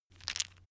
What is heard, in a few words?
stone gravel clatter short; natur-tiere; steine